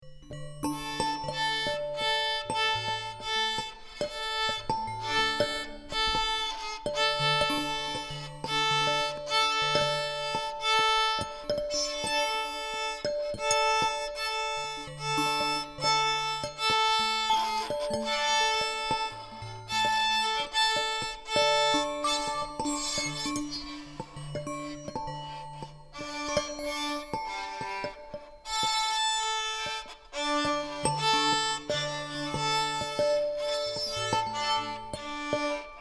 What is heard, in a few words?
Christmas bells and violin